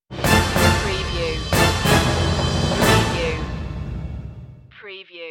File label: sting no choir